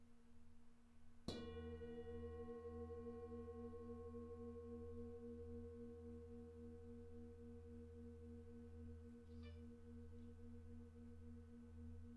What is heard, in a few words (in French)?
bol tibétin; atelier boucan